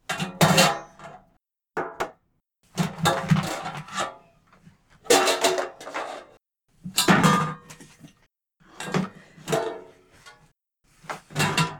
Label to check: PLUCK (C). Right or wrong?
Wrong